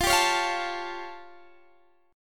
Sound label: A Augmented 7th F